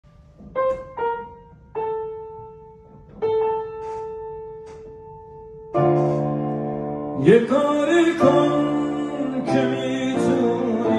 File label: piano